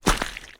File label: foley footsteps boots wet squelchy out of water